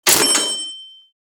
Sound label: Purchase ding; shorts sound effects